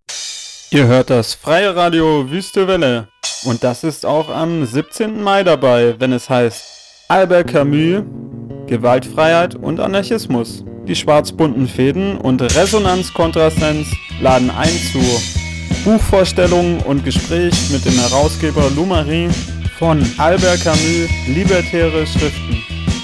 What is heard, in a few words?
Jingle; 17 Mai Camus